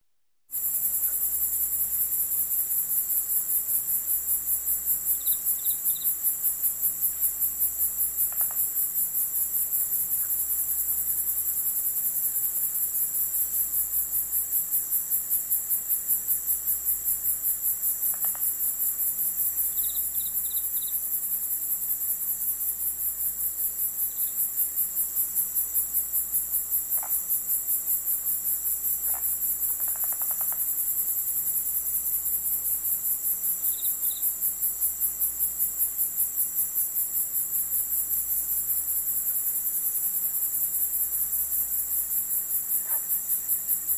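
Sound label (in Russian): звук ночи цикады и ночные птицы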